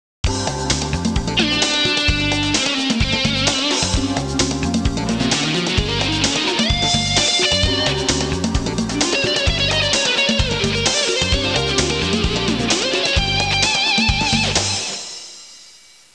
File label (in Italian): suonerie musica jazz